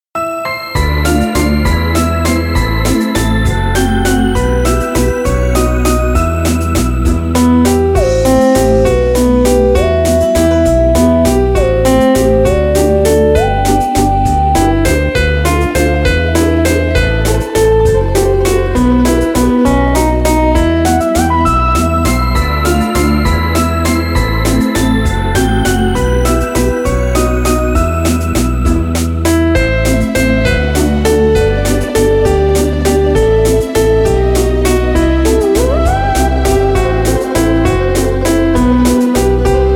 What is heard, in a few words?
karaoke